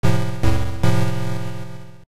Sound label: jingle boss taunt